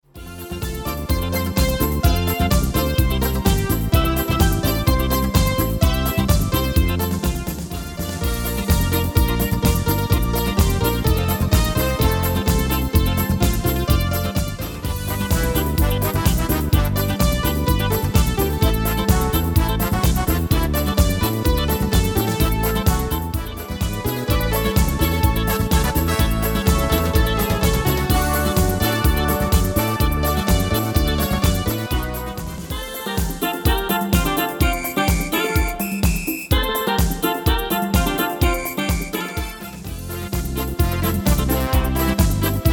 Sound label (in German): Samba